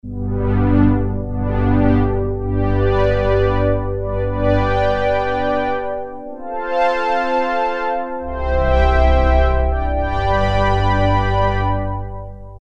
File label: Fanfare, Roland Juno-106; Factory Preset